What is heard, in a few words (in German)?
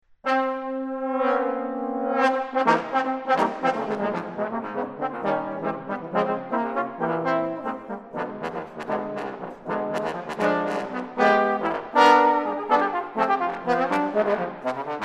Quartett; Schnyder